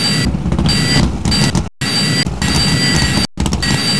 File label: stutter, jetpack; quake2